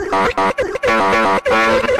brain fart

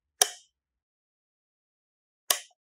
switch off lights, light switch

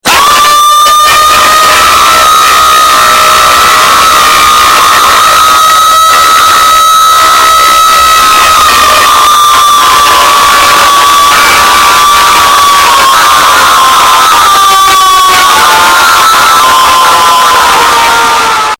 screaming bird loud

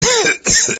coughing sounds

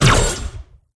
plasma shot

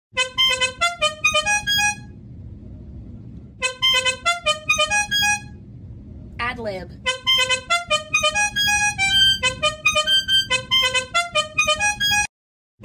funny horn

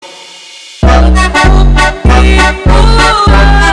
funk; bounce

